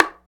Bongo Hi Slp; Percussion

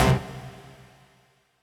Dance Mega Synth; Best Service; 90 s Sample CDs

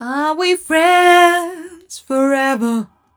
f mel e flat, fem Vocals

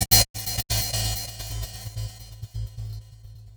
Gated Cymbal; musicradar

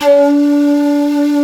shakuhachi D2; InVision Interactive; Lightware VOL-1; Instruments & Percussions

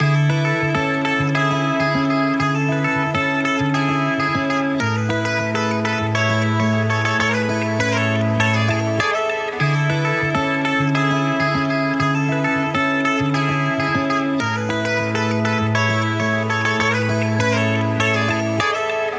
Melody Loops; Kagi

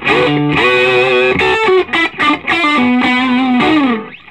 SOLO, Vintage Blues Guitar; USB Soundscan vol.22

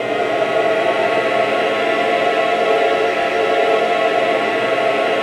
AVANTE G, Voices

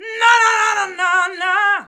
VOX VOX; Sound & Vision - Gigapack II CD 2

